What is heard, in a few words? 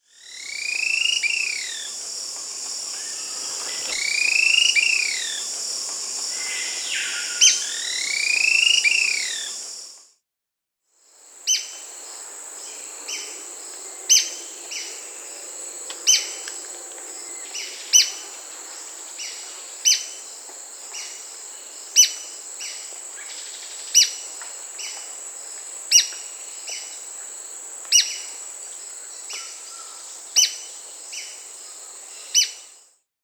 White-crested Spadebill (Platyrinchus platyrhynchos), Peru; S. America